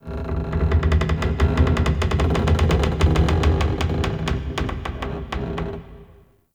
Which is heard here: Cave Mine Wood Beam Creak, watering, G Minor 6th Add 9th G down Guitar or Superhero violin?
Cave Mine Wood Beam Creak